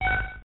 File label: Credit SFX